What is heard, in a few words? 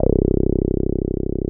TB C5, TB-303; Masterbits; Vintage Voltage